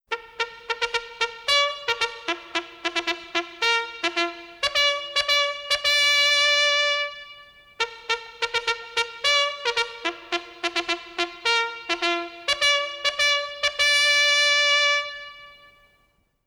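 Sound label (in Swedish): Tapto jägarhorn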